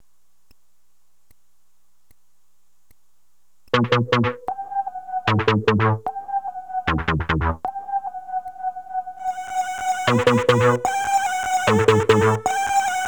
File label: Intro Pad